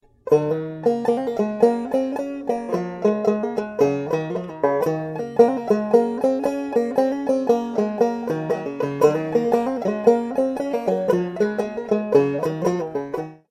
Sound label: Instrument